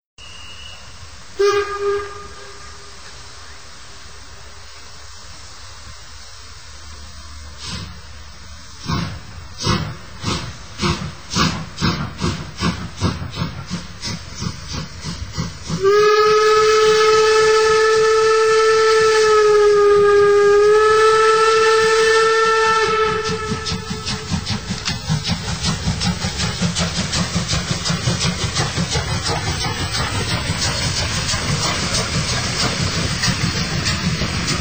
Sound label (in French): train a vapeur; radiocol